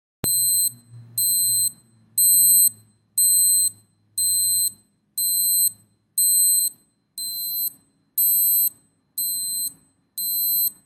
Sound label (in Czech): ukazka zvuku alarmu